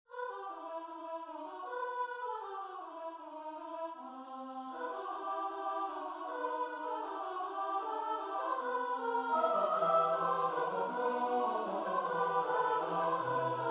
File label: midi; nowowiejski; sobotka kanon; Biblioteka Utworów Chóralnych przygotowana przez Towarzystwo Muzyczne im. Edwina Kowalika